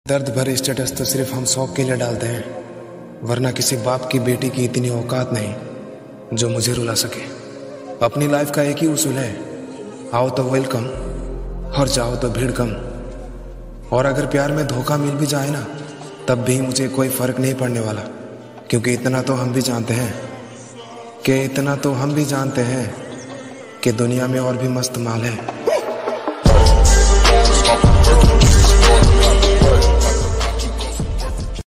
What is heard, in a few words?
Attitude Ringtone